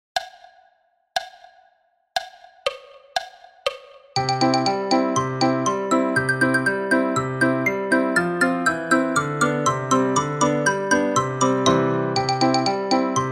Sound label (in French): xylo et piano a 120 bpm